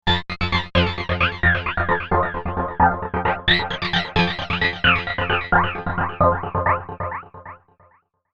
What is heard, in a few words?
Trance